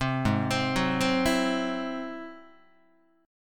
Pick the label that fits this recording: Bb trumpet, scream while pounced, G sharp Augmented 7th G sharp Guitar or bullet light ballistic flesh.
G sharp Augmented 7th G sharp Guitar